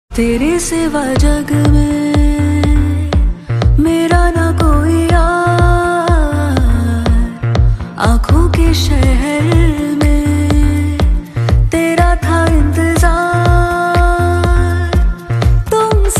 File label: Ringtone